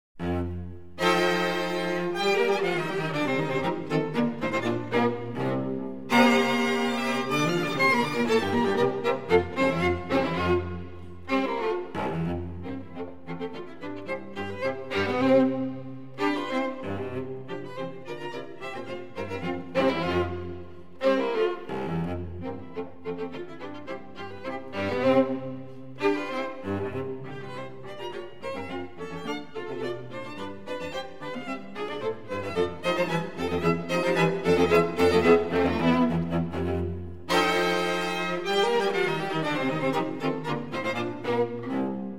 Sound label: String Quartet in E Minor Allegro Moderato - A la Polka; String Quartet No. 1; From my life